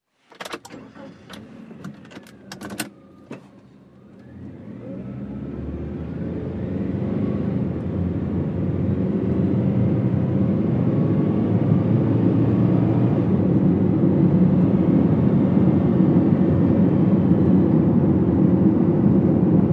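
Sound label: Small Car Engine Accelerate 55 mph, compact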